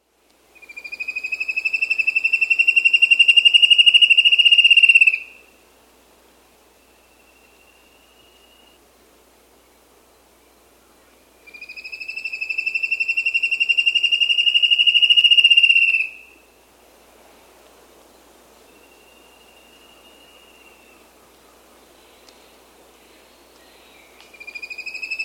Spot-Backed Antshrike, S. America

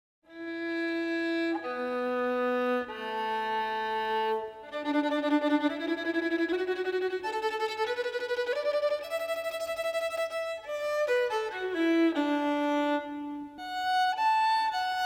Desert Blues

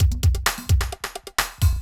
electro kit 130

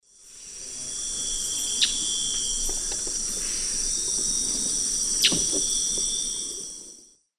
Chloroceryle americana, flight calls; Eastern Lowlands

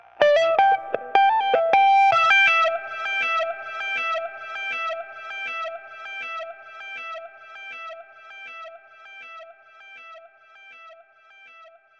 FX Guitar; Guitars

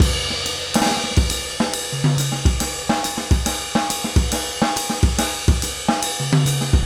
trip jazz 140

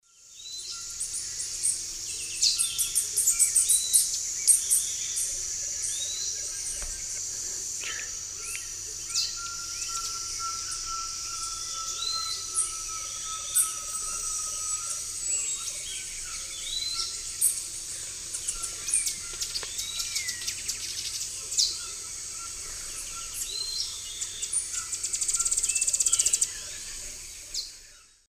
Tangara nigrocincta, calls and song; S. America; Eastern Lowlands

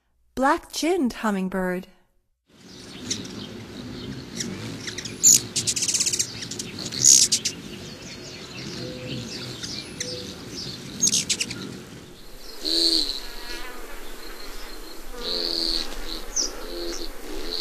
Black-chinned Hummingbird; North America; Stokes

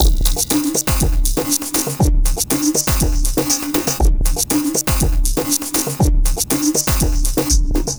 Shaky Groove, Electronica Composite Beats; Sound Effect Library

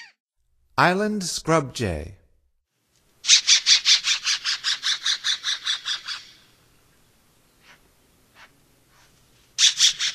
Island Scrub-Jay, Songs of Birds of North America; Stokes

